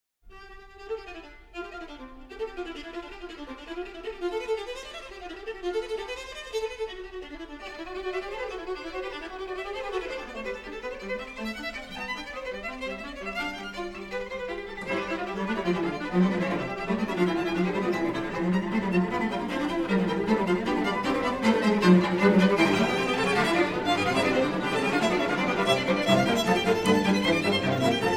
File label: String Quartet; Beethoven; Op. 59 No. 3; Fourth Movement